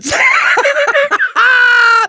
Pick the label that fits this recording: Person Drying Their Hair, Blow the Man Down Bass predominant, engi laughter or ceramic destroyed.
engi laughter